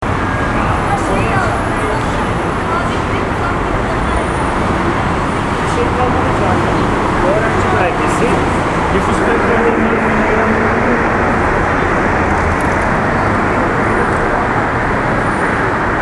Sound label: metrobus soundscape